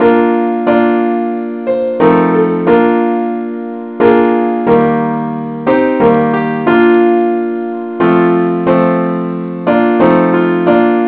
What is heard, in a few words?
simple acc key bes; i need thee